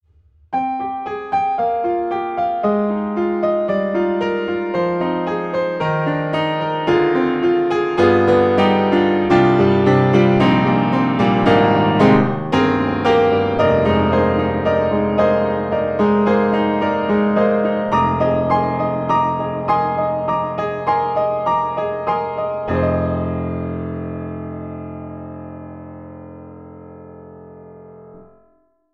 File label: Pianoteq D4 Worn Out; MODARTT S.A.S.